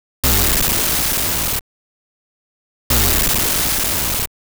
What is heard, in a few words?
Self-detonation